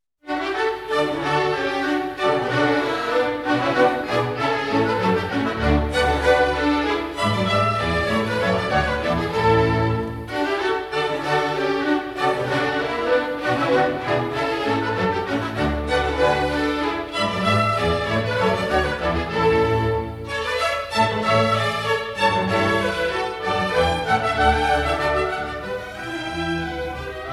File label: Orchestral Suite No3 BWV1068; Bach; Talicj